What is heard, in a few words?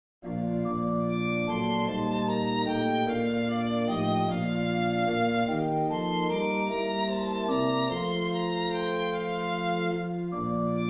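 Descant Violin Keyboard; It Came Upon the Midnight Clear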